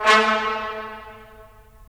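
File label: brass trumpets staccato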